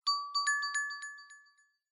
Smartphone UI Sound Speaker

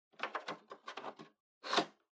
TV Hardware Card Plug In

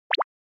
Anime Motion, Mid High

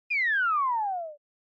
Motion Falling Whistle Fast

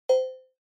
Cowbell Synth

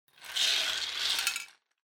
Drawing Machine Garapon Spin Drop

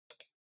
PC Mouse Far R

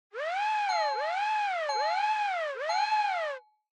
Fire Truck Siren Fake Siren

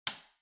Light Switch Rocker Hall Mid

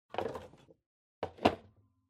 Electric Fan Put Down

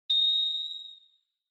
Flash High Short; Onoma; オノマトペ 光る; 高 短

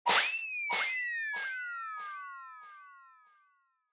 Motion Blow Away Long Delay

